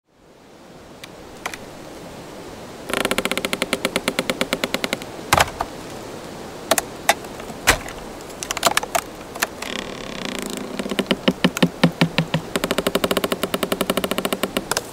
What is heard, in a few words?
Wood Sound Effects, Creaking Trees, Wood Impacts, Cracks and Forest Textures